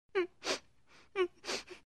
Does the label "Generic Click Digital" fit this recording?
No